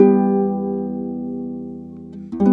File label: Harp, Orchestral Series Rock and Pop; Sonic Foundry (Sony Creative Software); Orchestral Series VOL-4 - Rock and Pop